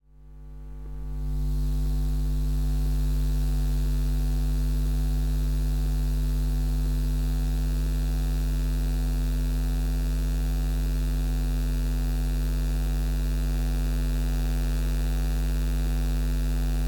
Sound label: Electromagnetic Field Dishwasher; Fox Audio Post-Production; Electromagnetic – Field & Emissions